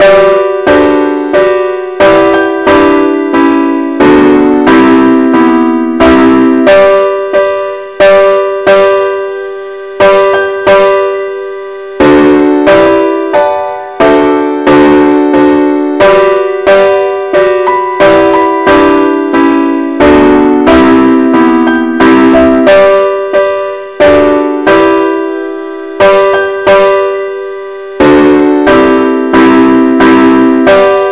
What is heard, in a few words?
amazing grace compare Chords